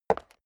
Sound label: step leather shoes concrete single right